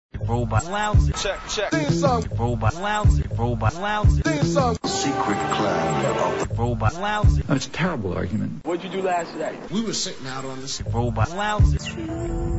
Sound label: robot lounge theme